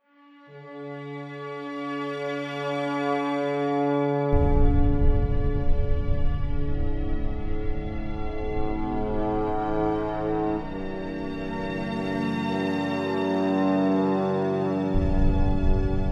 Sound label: Evolving Strings Drama Production Music